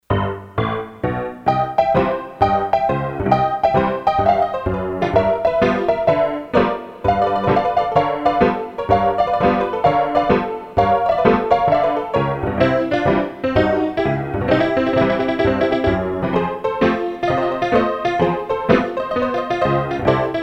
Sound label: HONKY, Pianos; Korg DSS-1